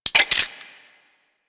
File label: Gunblade Mech